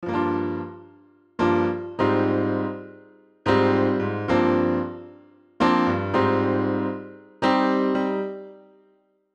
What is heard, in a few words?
PIANO; Ivories